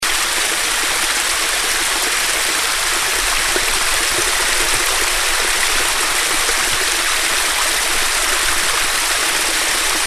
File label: Cedar Mill Brook Falls